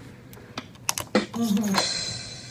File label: joke drum; Picoboard